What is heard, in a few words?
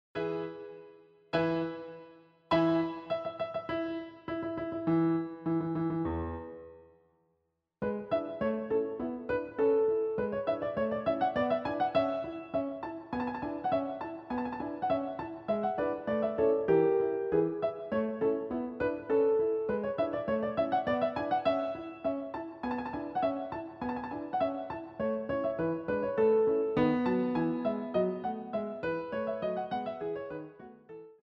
Mignon Gavotte; Ambriose Thomas